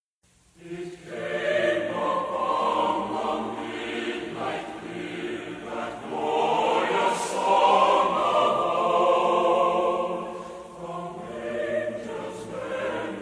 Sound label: Chorale; It Came Upon A Midnight Clear